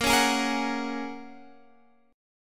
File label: Bb Minor 7th Sharp 5th; Bbm7#5 strummed